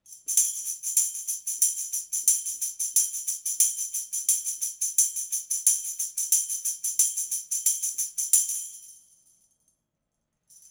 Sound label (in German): schellenkranz; tambourine; mkl-2500; Oktava